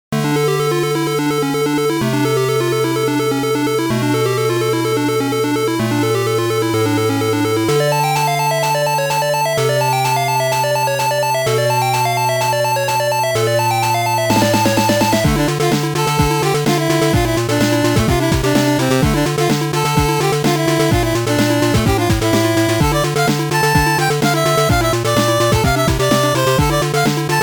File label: 8bit; act09 stage05 GB